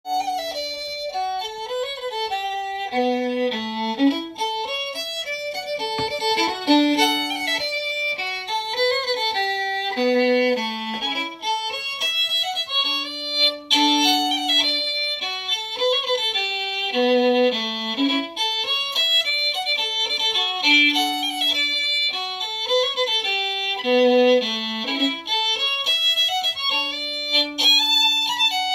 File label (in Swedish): Polska, svensk säckpipa; Fiskesåpolskan; av Tore Lindqvist